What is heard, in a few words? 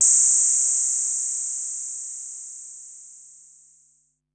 Noise High, Korg Wavestation-SR, Drum Kit; Wave 455 - Drum Kit